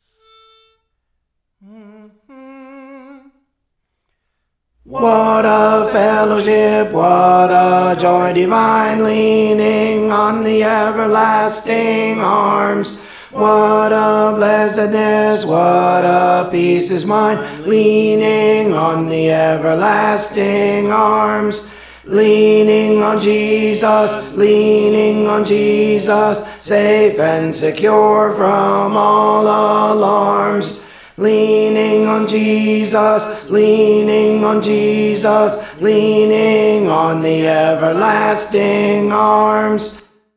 Tenor; Praise For The Lord Training; Leaning on the Everlasting Arms

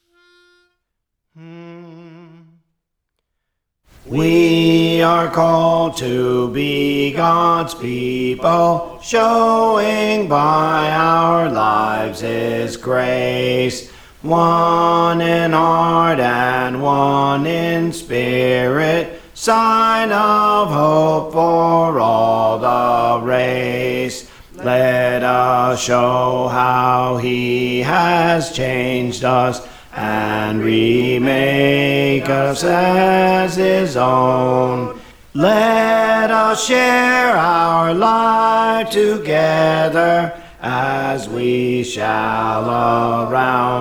Bass; Praise For The Lord Training

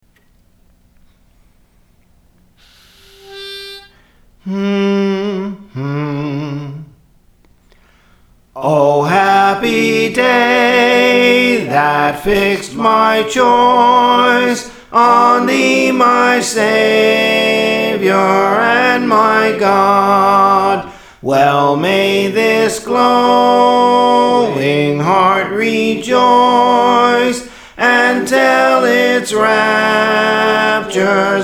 Soprano, Songs; Praise For The Lord Training

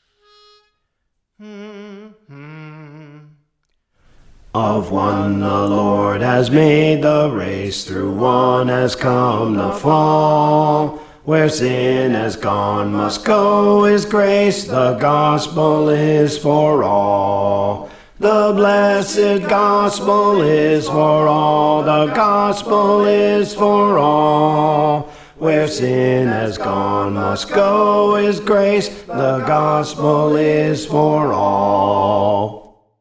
Bass; The Gospel Is for All; Praise For The Lord Training

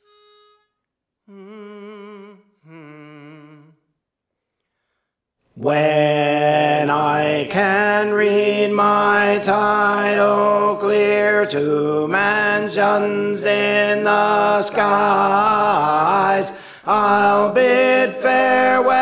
Tenor; Praise For The Lord Training

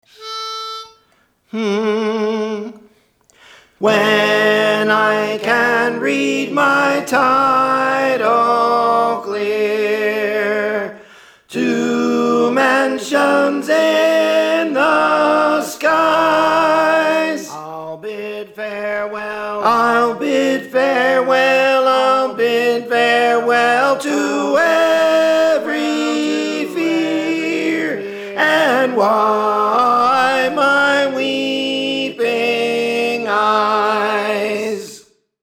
Soprano; Praise For The Lord Training

